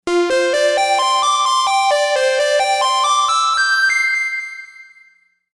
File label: gun lead, microkorg